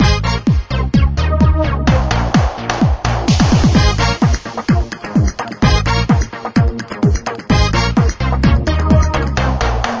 Energetic

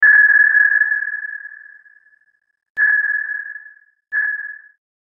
Ping; silent hunting

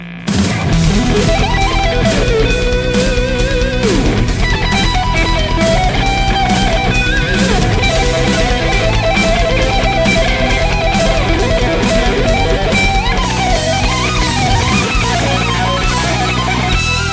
guitar solo

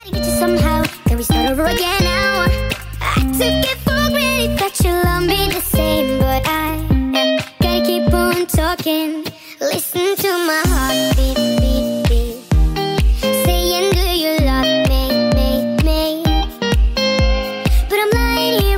heartbeat nightcore

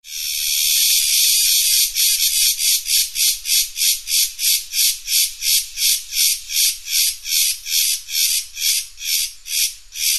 shivary cicadas; animals

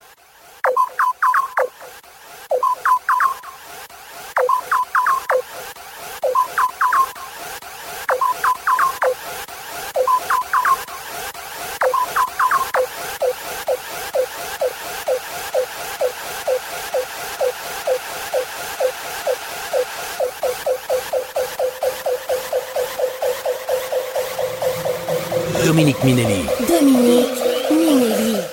Is Calling Suspense

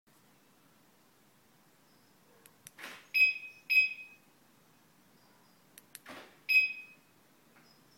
AUDI ALARM CHIME

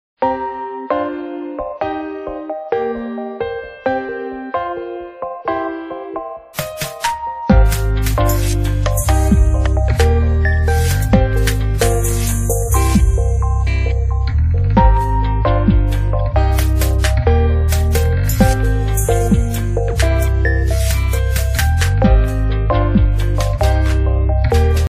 Piano; Miku

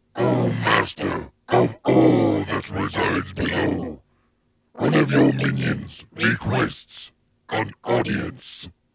minions ring tone

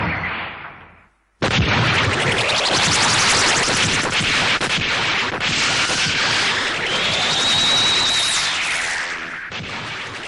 Shock Cannon

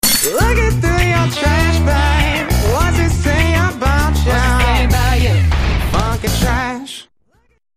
Funky Trash

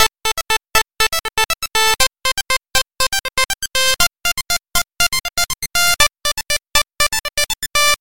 Chip G Funk

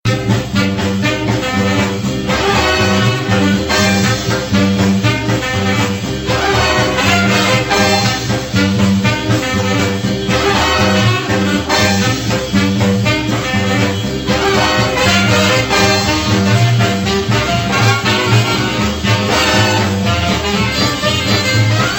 swing

